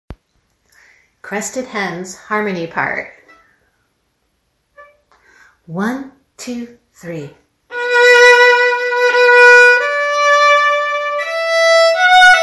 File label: crested hens harmony